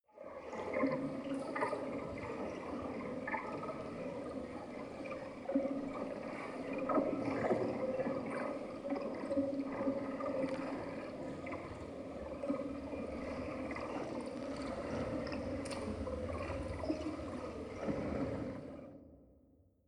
water fx

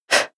female breath fast out

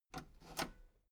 metal lever button radio enable slow

